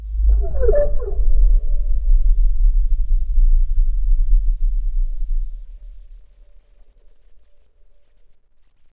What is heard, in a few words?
impact; Far Gate